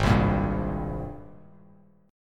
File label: G sharp Major 9th G sharp, Bass Standard; G#M9 strummed; JGuitar